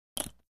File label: Darts; Dropping Several Darts.